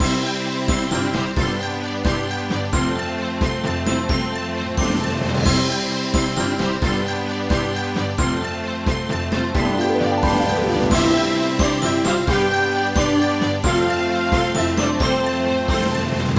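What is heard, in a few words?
background music lobby royal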